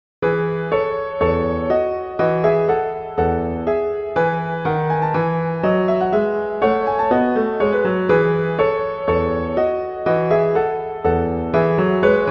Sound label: Gavotte; Bach; French Suites; Suite VI